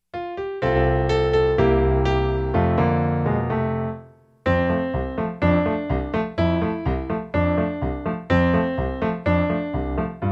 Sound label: Piano